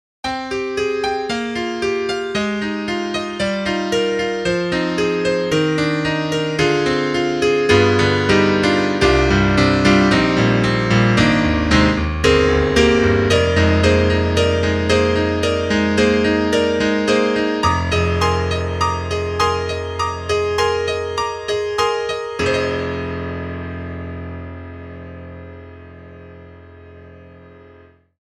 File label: Bright Yamaha Grand; SoundFont2; Fluid R3